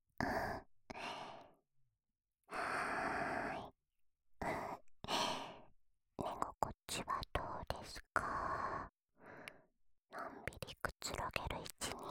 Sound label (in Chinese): 左耳掏耳